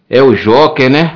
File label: berimbau